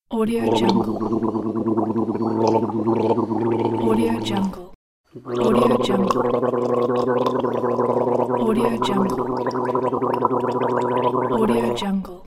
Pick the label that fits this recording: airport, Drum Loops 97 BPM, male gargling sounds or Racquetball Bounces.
male gargling sounds